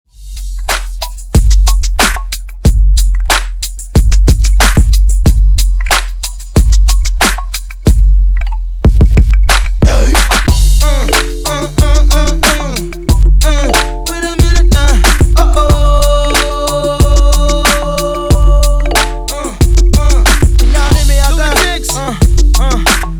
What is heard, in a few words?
92Bpm